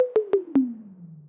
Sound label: wonderboom battery level critically low